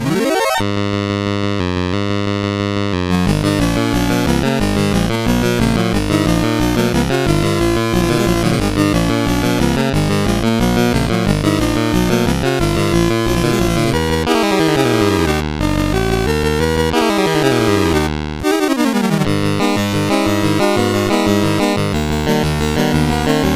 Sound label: Battle Medley, sid CSG8580R5; Final Fantasy